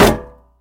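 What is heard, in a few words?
comic shot at tin can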